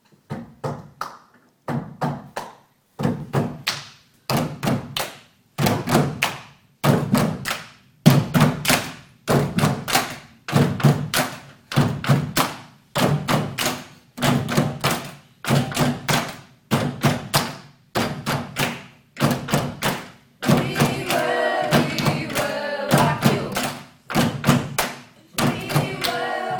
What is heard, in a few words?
percussion